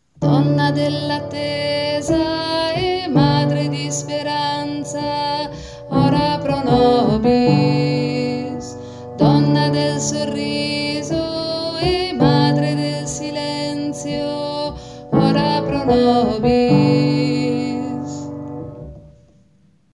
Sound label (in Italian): ave maria verbum panis strofa contralti